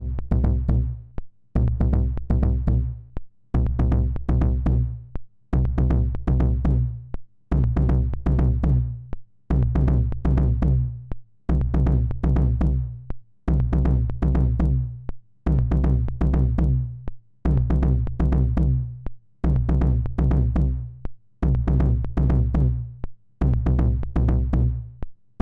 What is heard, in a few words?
KICK pitch env DECAY; Snazzy FX KICK ME